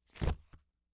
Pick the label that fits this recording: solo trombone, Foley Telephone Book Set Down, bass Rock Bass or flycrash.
Foley Telephone Book Set Down